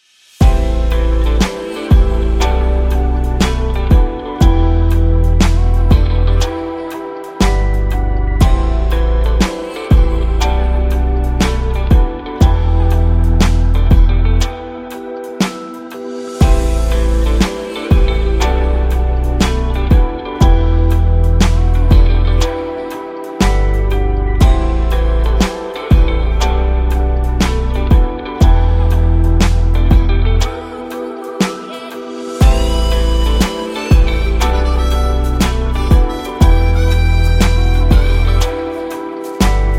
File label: Vinyl Vibes Blues Lofi